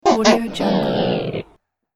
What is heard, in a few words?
dj record slow down